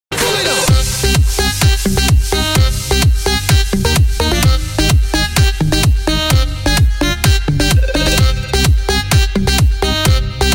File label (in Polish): klubowe zm